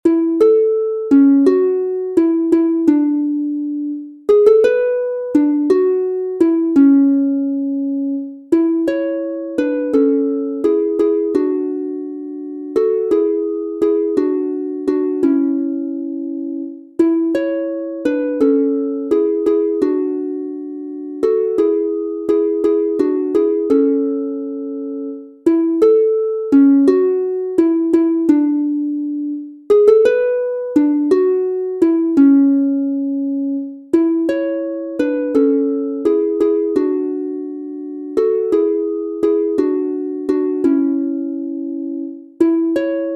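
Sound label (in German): A Dur 2 stimmig instrumental, Harfe; Liebesfreud und Liebesleid; Wer lieben will muss leiden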